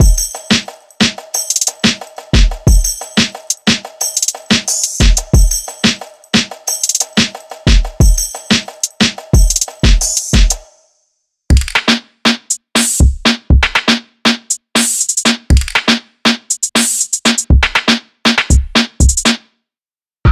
Dancehall Afrobeats

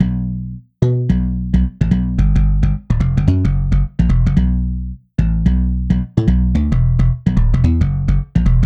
Funk

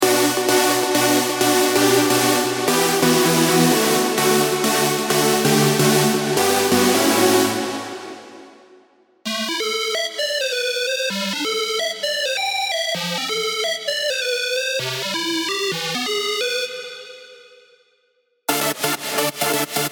Trance Psytrance

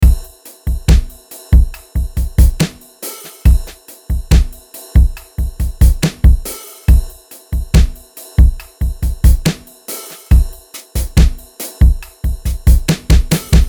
Country 70BPM

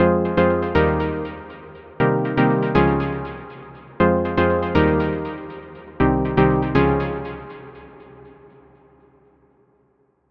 Chords Rhythm Synthwave Synth-Pop; midi wizard

